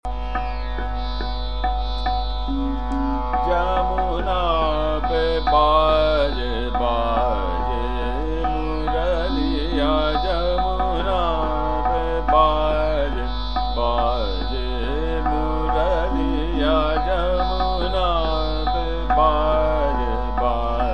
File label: Chota Khyal - Trital, Bihag; Jamuna Pe Baje